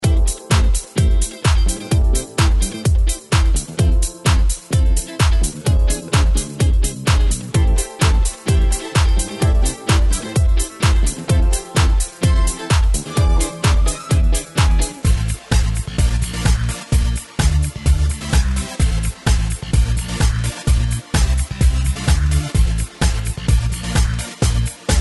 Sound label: Diva Grooves; Diva Grooves Plus